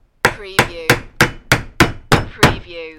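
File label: Hammer Nail Hits; 8 Hits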